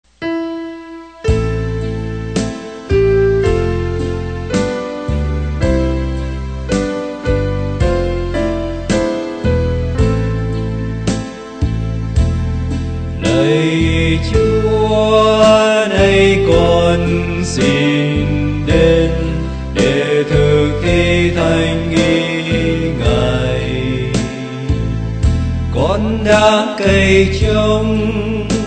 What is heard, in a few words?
bass